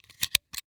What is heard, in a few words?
Industry Tool Box Cutter Extend Blade Out